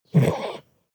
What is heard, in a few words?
goat grunt